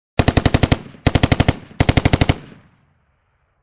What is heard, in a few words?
Machine gun shooting in intervalls